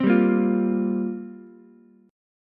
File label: F Suspended 2nd Suspended 4th F down Ukulele; strummed; JGuitar